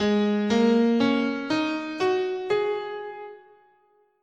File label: Ab Suspended Pentatonic